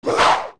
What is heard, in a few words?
Stalfos Attack; zelda; OOT